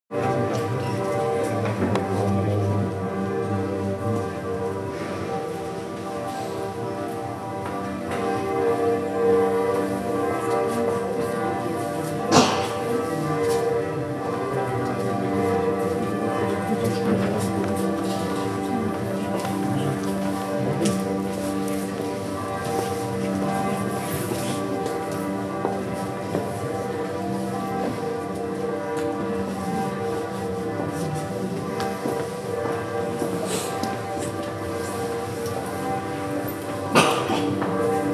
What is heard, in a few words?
inside church atmosphere